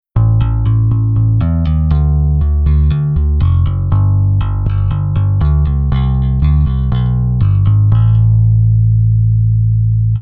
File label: Bass Interval Off